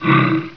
alert, grizzly; wanted

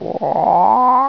chick scream; chicken; wanted

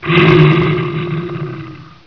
die, grizzly; wanted

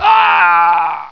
die, cowboy; wanted

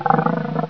puma, idle; wanted

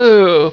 pain; wanted

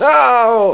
scream; wanted

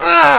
pain; wanted

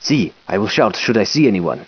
shout; wanted

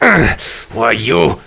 pain; wanted

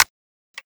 safe

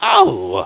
pain

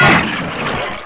gat jamb, weapons; wanted

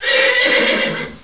horse; wanted

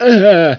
scream; wanted

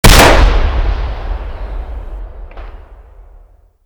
shoot, law

